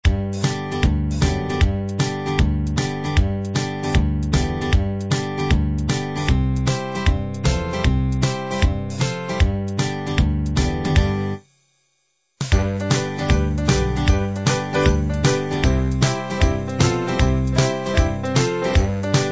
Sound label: gospel